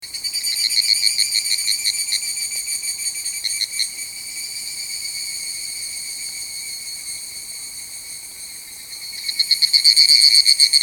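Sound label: Japan Higurashi Cicadas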